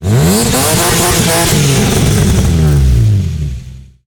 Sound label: Rally Car High Revving